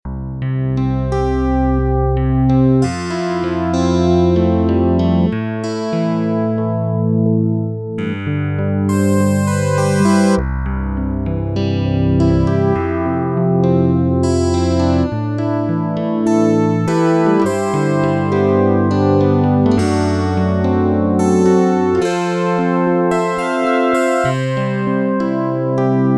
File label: guitaristic piano, Korg DW-8000; Factory Patches